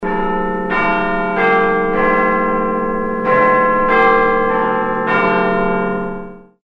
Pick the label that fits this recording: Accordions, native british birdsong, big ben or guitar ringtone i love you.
big ben